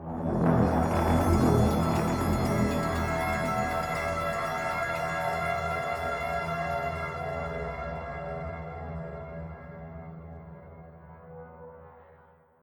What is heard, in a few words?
Stinger Pulsating Short; Execsearch; Varb